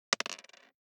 DICE on Plastic, Throw and Roll, Standard, 1 One Die; Samples; ArticulatedSounds; DICE Sfx Party (Extended)